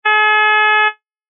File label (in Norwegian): Trompet